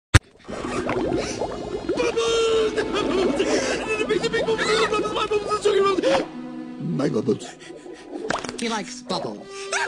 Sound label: bubbles